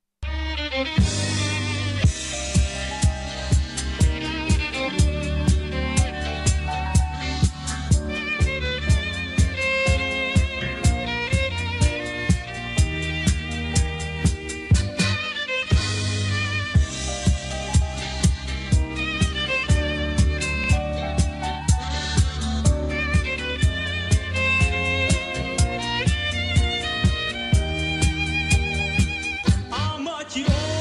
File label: tango; Levko Dutkovskyi